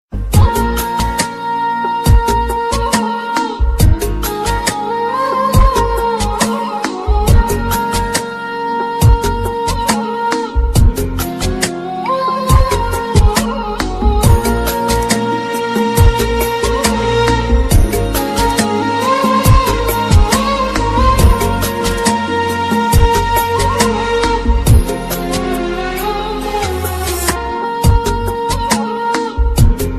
Very Romantic Tone

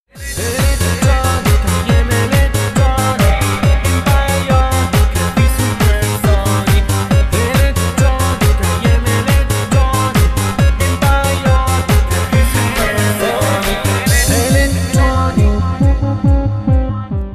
Electronik

